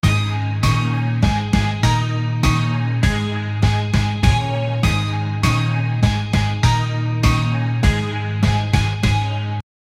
song bgm melodic

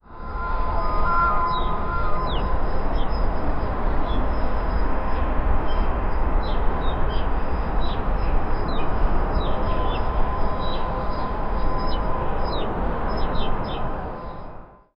the noise of the air

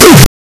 male player damage grunt

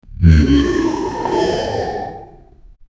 short deep sound of demon

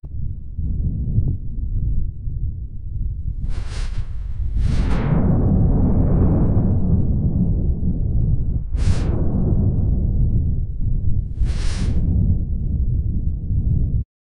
wind jump audio for a, game effects

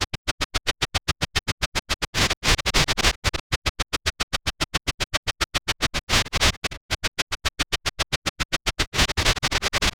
rhythmic wet slime; organic

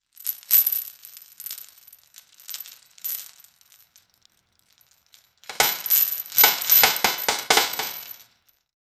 chain breaking cinematic; broken

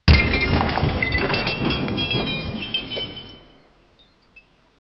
ocean waves slap the wood, ships

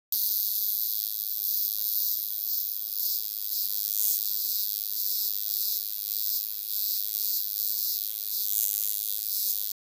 aggressive fly insect; aggressive fly insect sou

